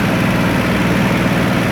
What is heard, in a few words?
Plane Prop Loop; Airplanes